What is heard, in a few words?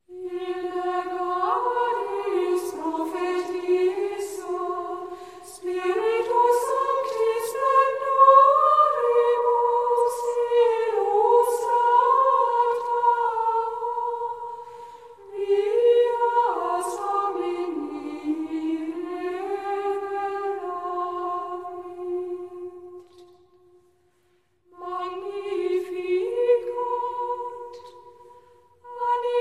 Antiphon; Hildegardis Prophetissa